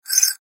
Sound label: Slide Movement Multimedia; Technology; Slides; Objects; Blastwave